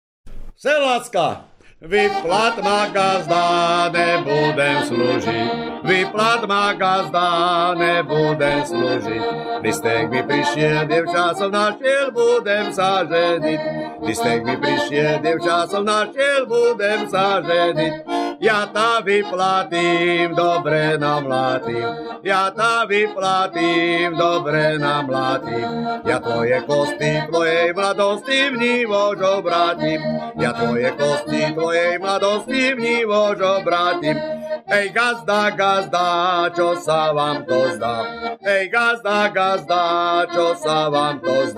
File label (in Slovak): sedliacka